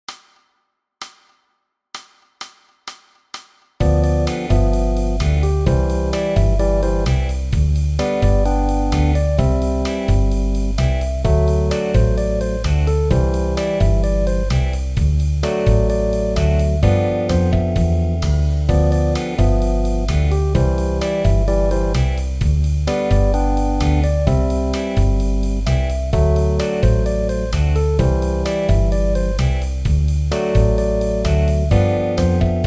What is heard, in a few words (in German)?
bridge mit Bass